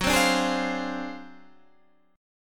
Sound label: D 7th Sharp 9th Flat 5th Gb, chord